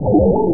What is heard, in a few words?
rusty LOW; Hottest 6 (1996)(PDSoft)